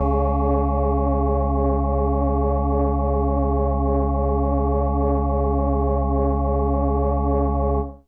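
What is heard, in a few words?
Indie Organ Kawaii C#1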